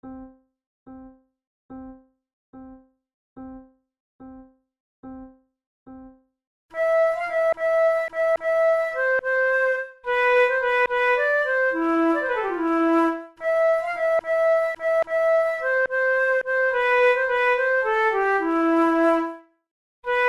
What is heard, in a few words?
duet